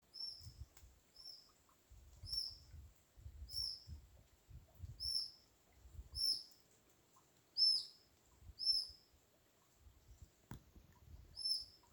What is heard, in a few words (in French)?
loutron siffle